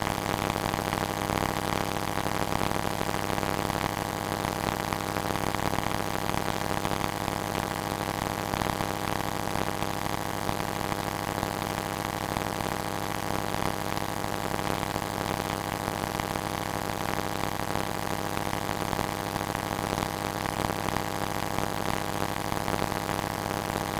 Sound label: glitched tones AM Radio Interference